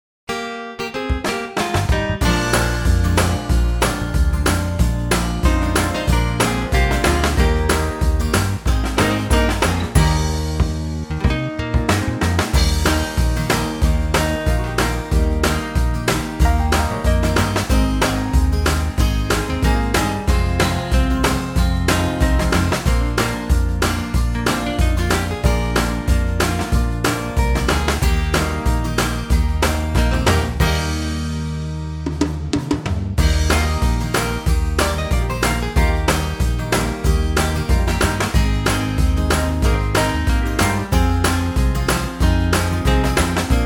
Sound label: Eb country